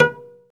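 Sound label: SOLO CELLO, Synclavier Strings; ILIO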